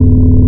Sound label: Space Ship Engine Large Loop; little robot sound factory